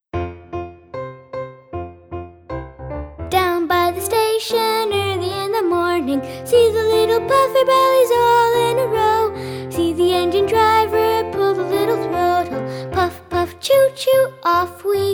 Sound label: Down By The Station vocals